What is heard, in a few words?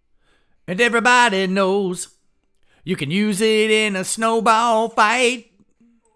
vocals